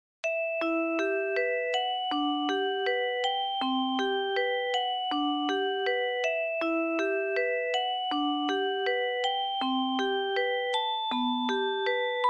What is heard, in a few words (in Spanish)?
estudios basicos armonicos artificial